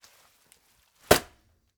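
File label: waffle towel sounds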